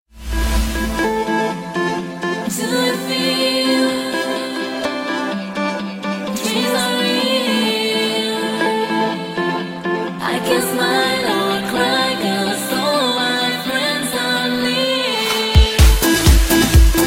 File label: Ibiza Sound